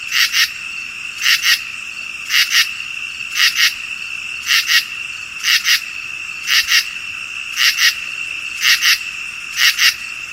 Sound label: Common true katydid Pterophylla camellifolia; Carl Strang